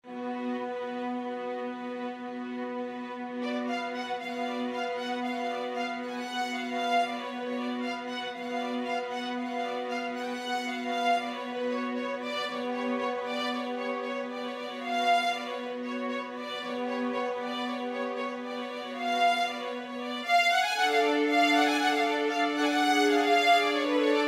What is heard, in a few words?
romanian folkdance, string ensemble; bartok